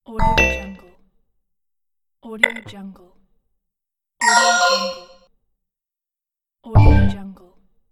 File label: socialmedia failed announcers; audioefx